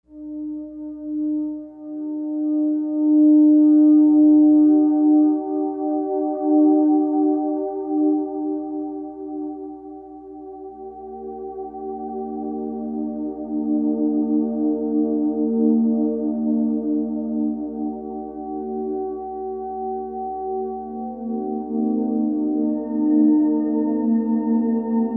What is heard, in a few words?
swarmatron